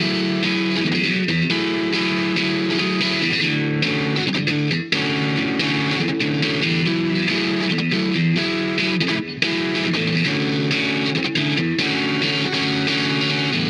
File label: guitar only